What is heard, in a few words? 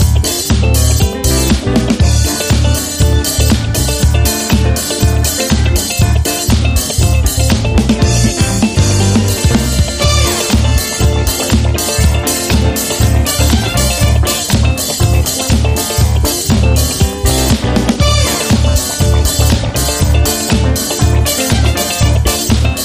That Disco Beat